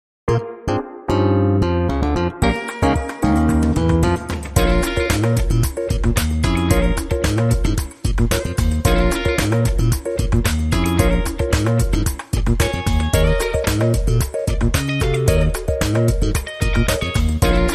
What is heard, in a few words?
Eye On Today Contemporary Groove